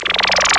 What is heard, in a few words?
warp; Amiga Soundtracker Sample Packs